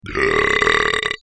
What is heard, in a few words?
brap; I belched that all out at once, one take, no breaks.